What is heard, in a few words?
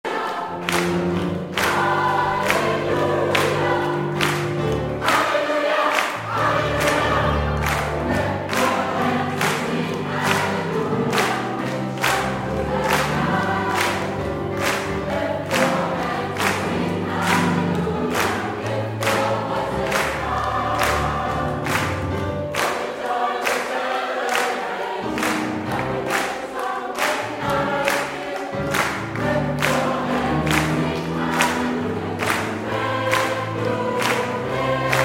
Clap your hands